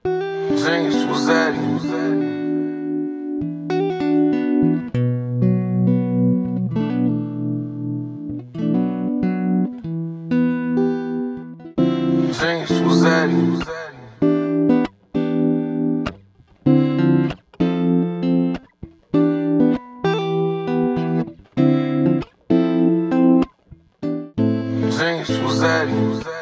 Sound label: Loops; Hijnx Blxst Sample Pack